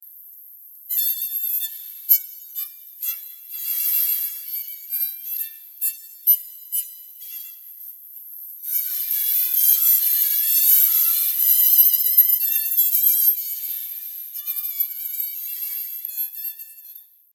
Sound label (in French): violon concerto filtre 8500Hz